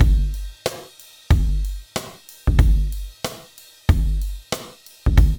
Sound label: DRUMS, Loops; East West; Funky Ass Loops